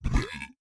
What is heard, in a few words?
Monster Spit; A Robot Named Fight